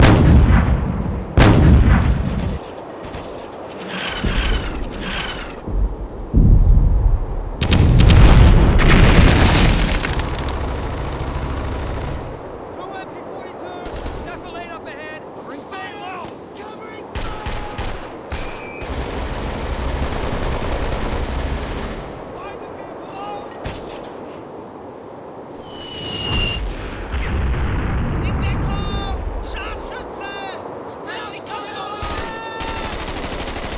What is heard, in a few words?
ambience